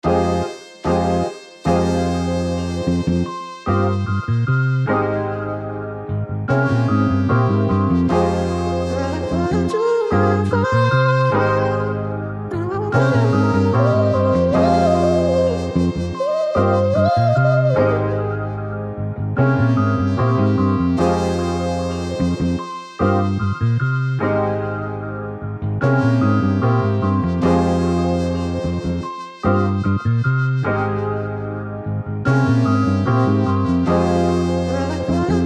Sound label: no drums, 149bpm